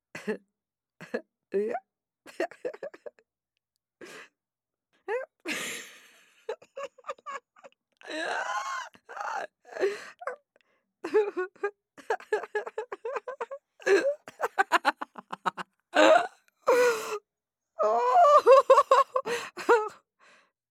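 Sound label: sounds of female laughter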